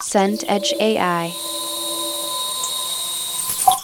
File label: drain water gurgle